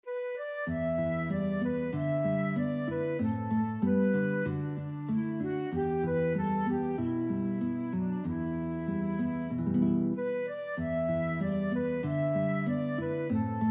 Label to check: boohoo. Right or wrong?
Wrong